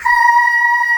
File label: VOICES E5, SYNTH VOX; East West - Global Sounds